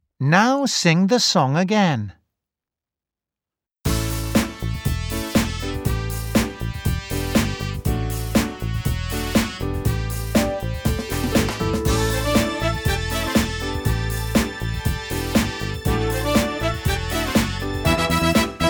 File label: Karaoke; Super Minds Level2